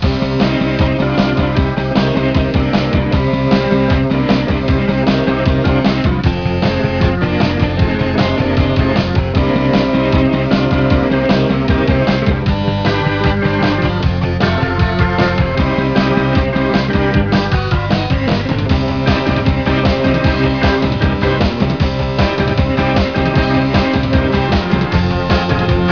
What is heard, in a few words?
Standard Music; Dirt Bike 4.3